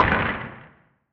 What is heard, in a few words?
asteroid damage interstellar small; Factorio